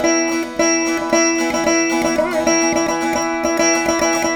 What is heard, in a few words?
VEENA; Spectrasonics; Heart of Asia